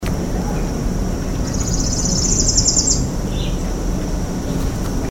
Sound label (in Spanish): pitiayumi